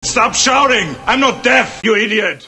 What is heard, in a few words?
shout